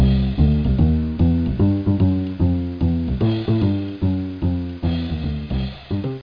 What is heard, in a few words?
jazz bass Drum